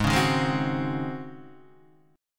C sharp Major 7th Suspended 2nd Suspended 4th G sharp